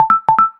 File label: Range High Alert; GPSMAP H1